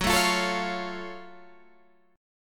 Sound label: F sharp Minor Major 7th F sharp Guitar Open G